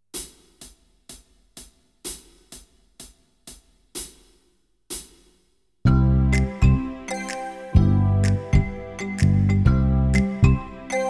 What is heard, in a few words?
Backing track, keyboard; Kenneth Baker - The complete keyboard player